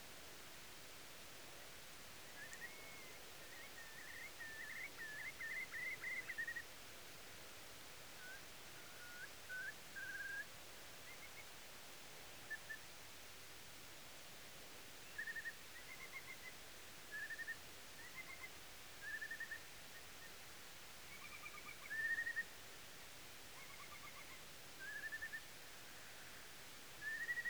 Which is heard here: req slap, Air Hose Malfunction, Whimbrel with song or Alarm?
Whimbrel with song